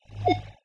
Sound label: vid beep